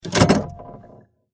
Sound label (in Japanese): 公衆電話受話器上げる